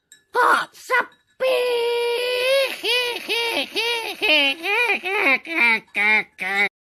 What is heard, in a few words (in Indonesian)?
Ayam Kok; Ayam Kok Sepi